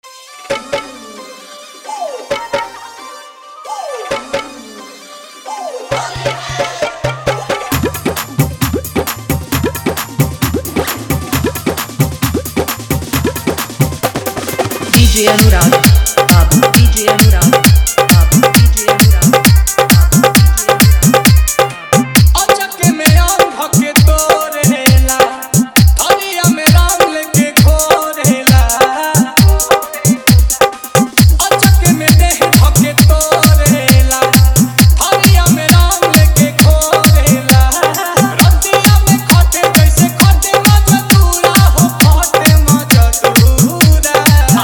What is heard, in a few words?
New Holi Tranding Bhojpuri Full Dhollki Bass Mix